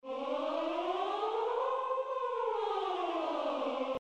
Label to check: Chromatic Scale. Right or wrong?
Right